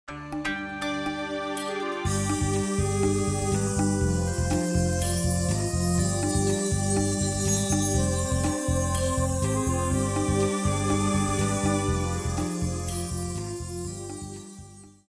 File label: Level Complete; Shipwreckers; PS1; Egypt